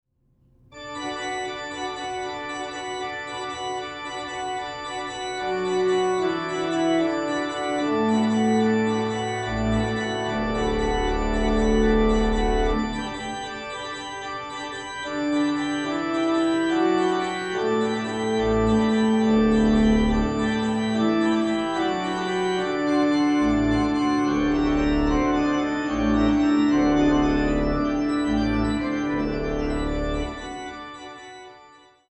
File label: Carillon de Westminster; Vierne